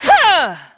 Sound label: EFFORT; VOICE; Pulse Racer